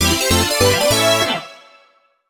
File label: Special Item Get; TTYD; Beat Saber Clear Fanfare